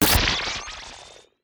lose radio shatter hit impact break; kids game; tech mech sci-fi

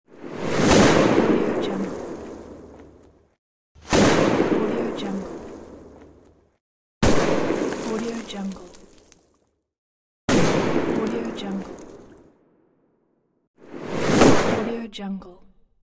explosion; kaelv

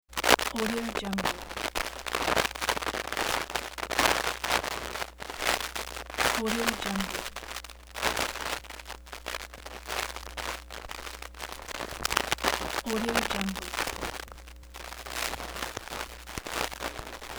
static audio gable

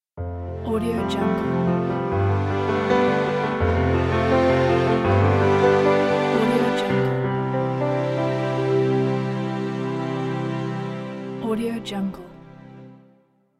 piano logo

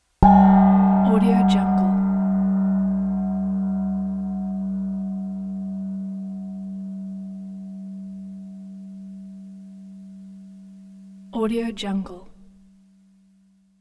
gong hit soft

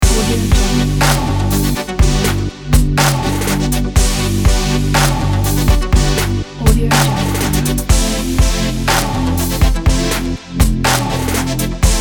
modern tech intro logo

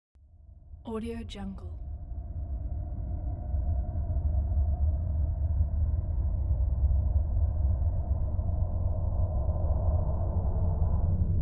horror ambience

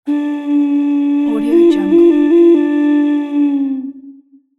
flute prompts

